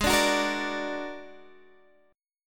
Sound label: Bb Augmented 7th Ab, chord